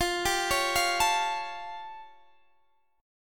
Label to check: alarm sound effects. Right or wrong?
Wrong